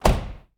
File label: Car Door Close; Automobiles; 1001 Sound Effects